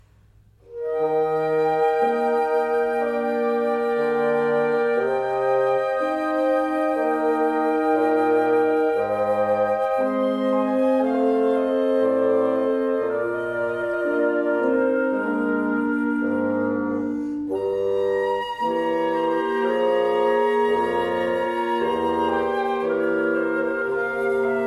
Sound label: Air On A G String; Recessional Music